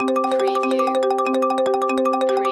Casino Slot Machine Arpeggio

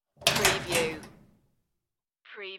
lock metal locker, the sound pack tree

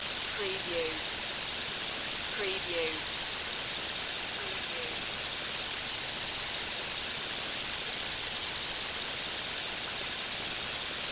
Hud Static Monitor; Sound Effects Bible

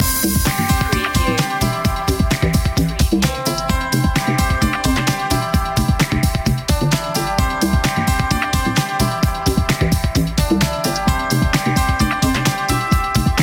electro cool loop; media music now

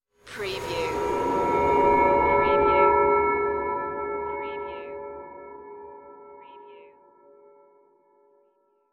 spacecraft flyby drone

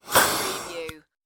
Inhaler Squeeze; Sound Effects Bible

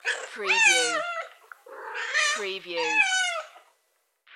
Cat Meows Short

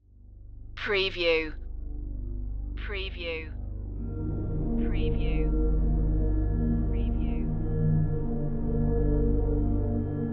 no perc

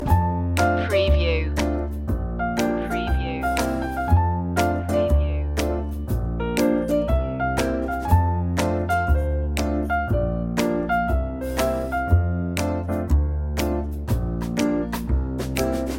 fresh and fun loop

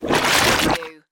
Whoosh; Sound Effects Bible

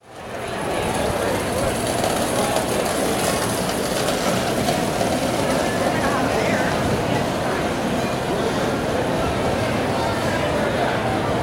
Concession Stand

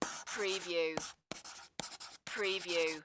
Pencil Write On Paper

